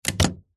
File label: household electrical plug pull remove from wall socket